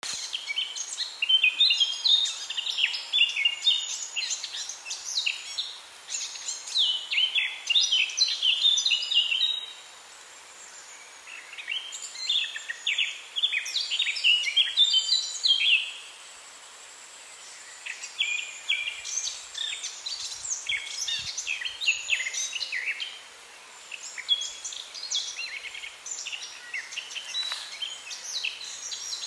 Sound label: unknown, Crab Wood